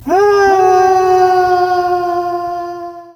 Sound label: fall; basic games; mcpgames; cooties